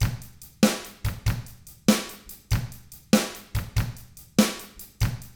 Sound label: ROCK 6/8, Drums; ILIO; Double Platinum Drums 1